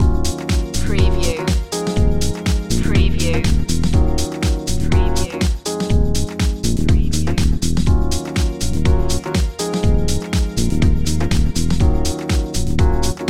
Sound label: soul club loop